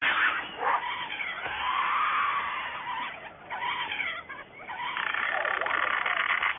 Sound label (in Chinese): 钓鱼线瞎跑音效